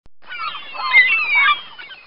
seagull sfx

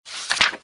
page turn sound effect

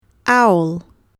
owl

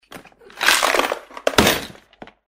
tools sfx

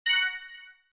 multimedia game star win gain slow; zapsplat

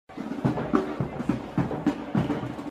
marching band sound effect

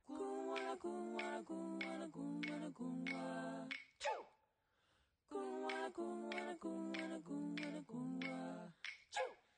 australia bgm

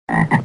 frog sound effect

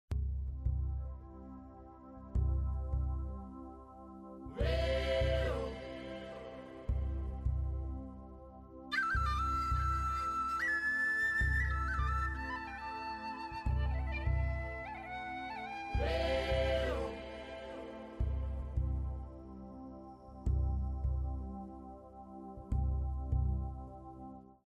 kenya bgm

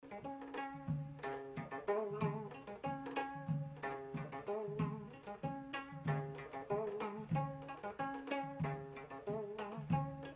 south korea bgm

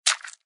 squish

